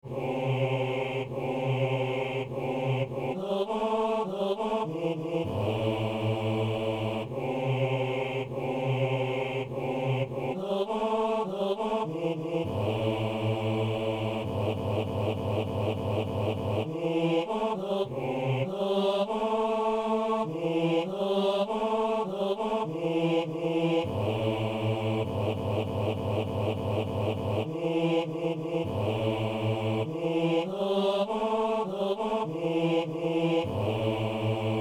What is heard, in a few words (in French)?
basse; Messe de l'Ermitage - Sanctus